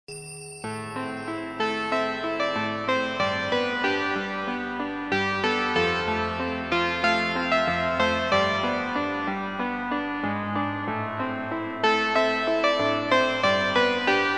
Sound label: meditation harmony